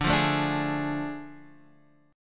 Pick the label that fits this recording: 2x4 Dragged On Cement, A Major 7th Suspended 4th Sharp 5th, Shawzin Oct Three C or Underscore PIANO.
A Major 7th Suspended 4th Sharp 5th